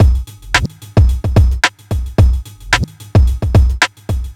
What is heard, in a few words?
r n b kit 110